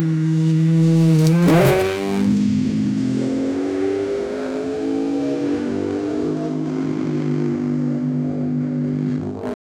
seamless looping, race

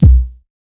157bpm f 808, hip hop

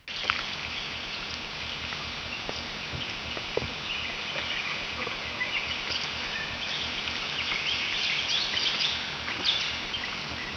distant animal roar echoi

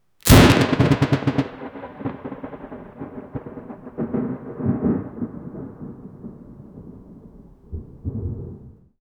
thunder crash lightning

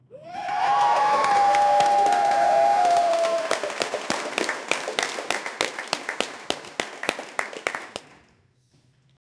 a short burst of loud, audience

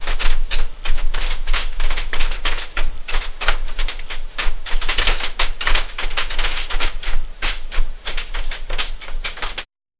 armor

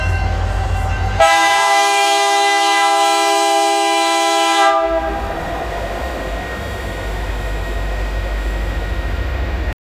indian train horn

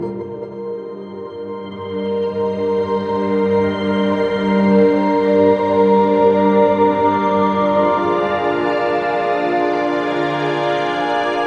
an evocative 30 second in, ambient synth

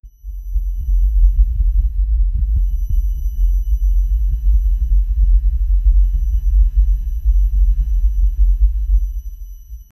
minimal horror track with, metallic hit

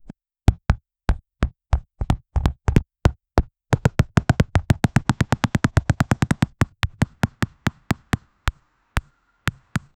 i would like the sound, tennis

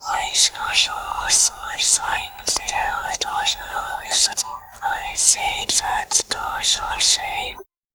crowed whisper magic whis

